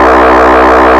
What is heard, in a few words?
lead; Stereo